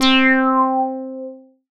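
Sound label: lead C4, ARP 2600; Polyend; Simulacrum Sounds; 1 Shots; Synths; Keys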